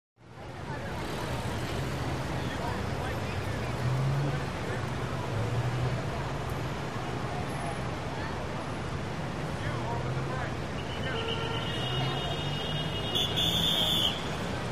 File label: Very Busy Intersecti, traffic cops